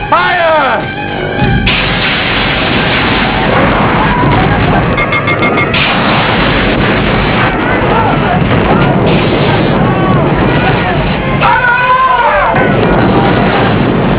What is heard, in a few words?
ATTACK